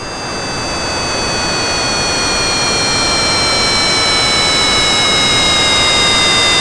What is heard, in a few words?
SYNTH FXS; East West - Global Sounds